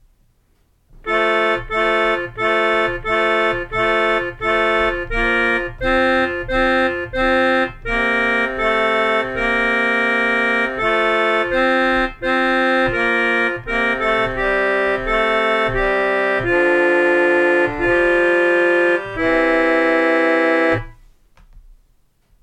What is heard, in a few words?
Alleluia soprano bass keyboard